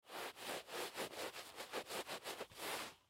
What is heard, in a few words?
Towel Scrub Mess Carpet; Bathroom; Foley; Blastwave